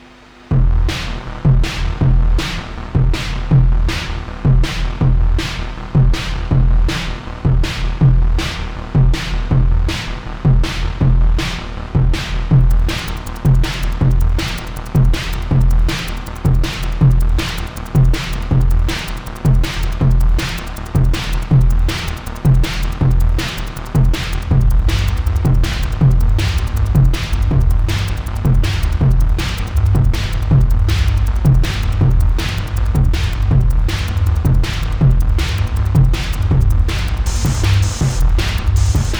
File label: void ambience; weeklybeats-2020